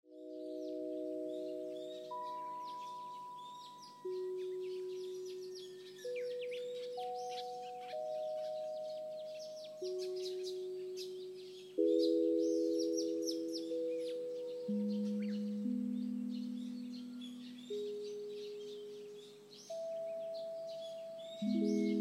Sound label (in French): Douce relaxation; ECHOSANTE